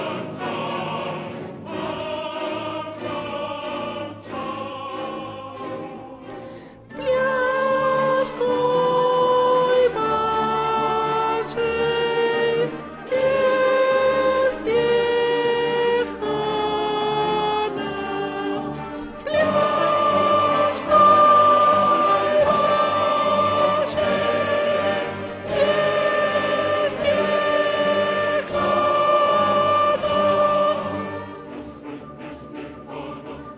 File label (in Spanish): SOPRANOS; REPERTORIO ÓPERA; Danza IGor